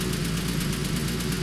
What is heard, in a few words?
Track Vehicle